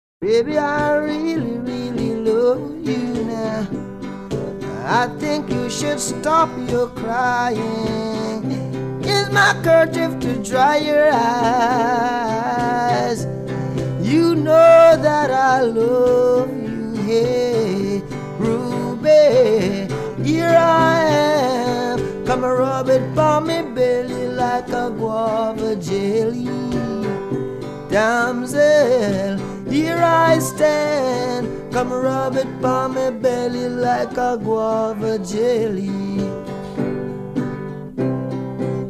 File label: Acoustic